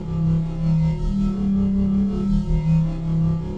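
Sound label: Ambience Sci-fi Energy Loop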